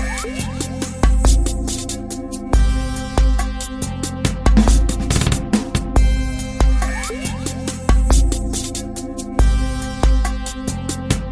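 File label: Samsung Cool Guitar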